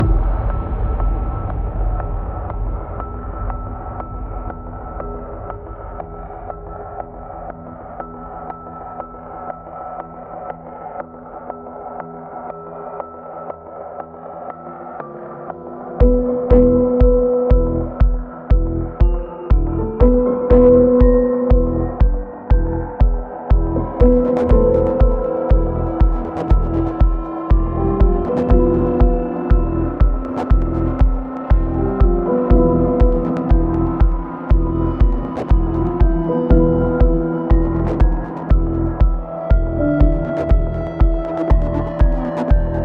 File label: Suspense; Necrobarista Final Pour